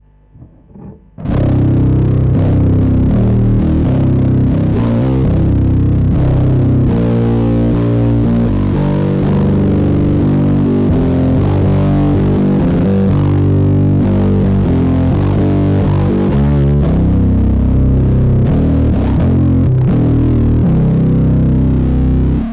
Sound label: muff none Si; diy